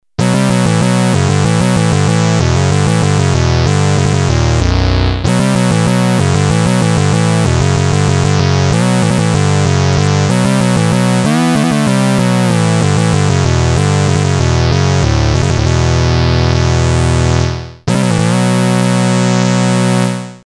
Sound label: synth, Moog minitaur